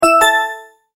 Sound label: multimedia tone verified